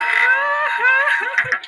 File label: crying